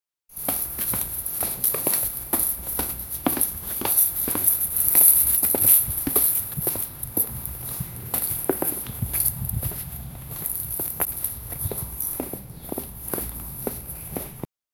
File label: make jungle sounds and so, animals